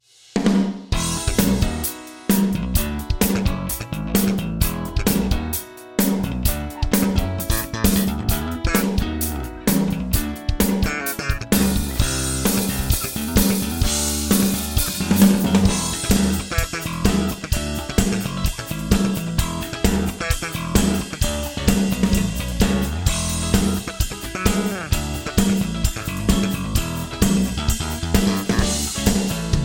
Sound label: Ensoniq DP Pro Drums Band Hard Wood Room